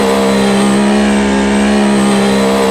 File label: in PHIGH; Germany; AOS F1 2022